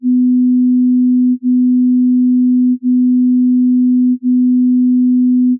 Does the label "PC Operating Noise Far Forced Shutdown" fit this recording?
No